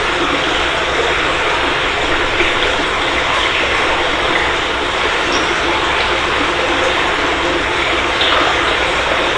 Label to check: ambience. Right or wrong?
Right